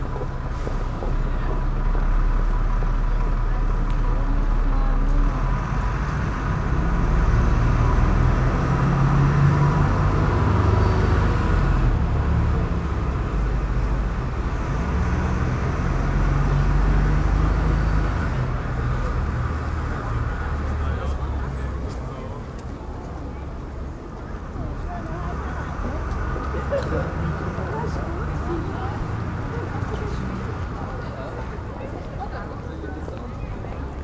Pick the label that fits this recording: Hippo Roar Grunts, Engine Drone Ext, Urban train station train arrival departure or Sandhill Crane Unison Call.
Urban train station train arrival departure